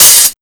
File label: HH OPEN; Big Beat Samples & More; AKAI